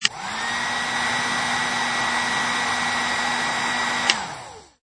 Heat Gun Light Click, Hot air heat gun, long shot, low power (first level), with key click; Heat Guns; Lynne Music